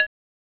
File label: Saw G3